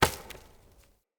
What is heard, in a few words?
Acorn Impact; Cinematics; Bromius; Bromius Tree Rub; Bromius Quest